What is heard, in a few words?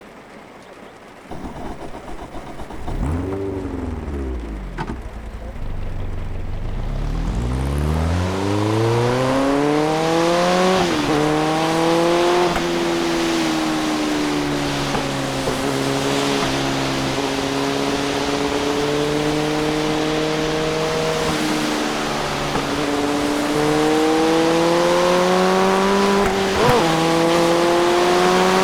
rain driving